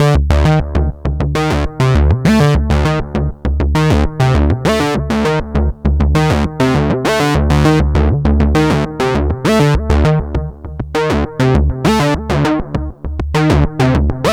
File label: matrix sync slide 100 bpm; arturia matrixbrute